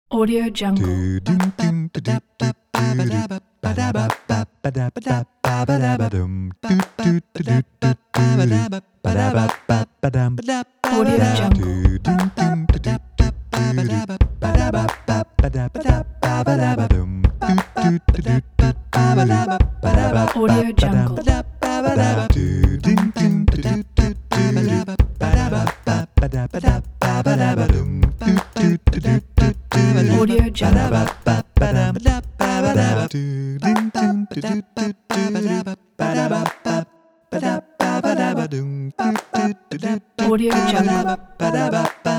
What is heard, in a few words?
acapella groove